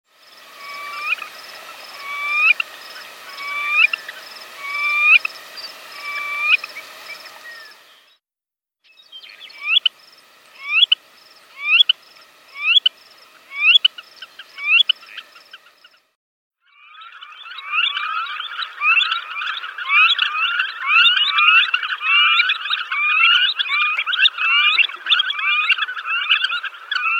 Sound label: Eared Grebe